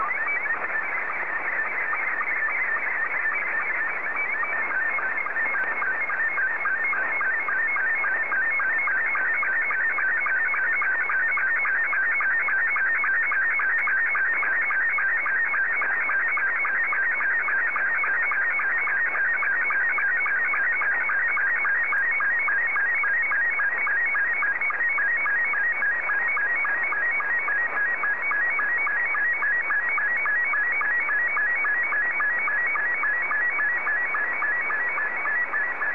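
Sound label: SSTV, Scottie 2; JA3QJQ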